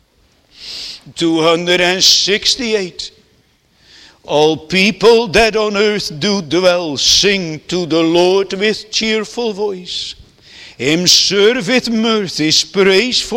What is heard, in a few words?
Singing